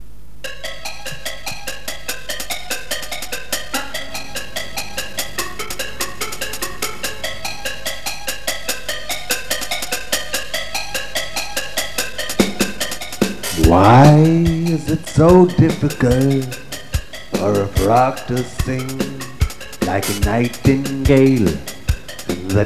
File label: Frog and Nightingale